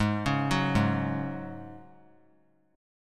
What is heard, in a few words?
G sharp 7th Suspended 4th G sharp; G#7sus4 arpeggiated; JGuitar